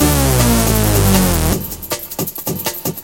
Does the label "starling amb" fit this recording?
No